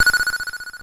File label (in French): ping Raquette